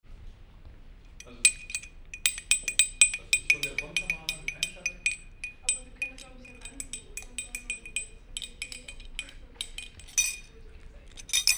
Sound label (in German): Binaural Tasse